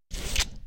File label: spider damage; Wackelpeter